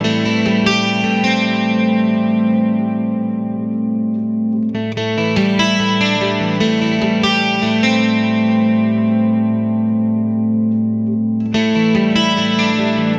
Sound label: guitar, stringed instruments